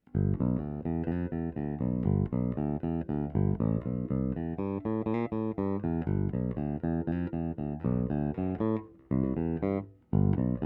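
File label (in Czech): S-x prsty, nerezová ocel, Roundwoundy, Schecter SLS Elite-5 baskytara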